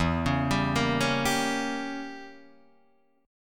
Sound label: E Diminished 7th E down Guitar Open D